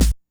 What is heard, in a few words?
kick snare; 129 Cmin; Big Fish Audio; Hardcore Breakbeat Action